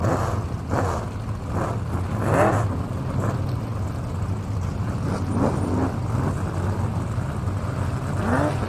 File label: race start idle revs; lightning speed